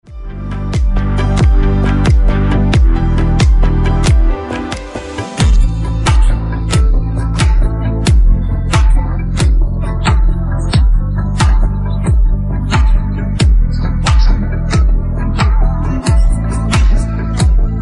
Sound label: beat, pop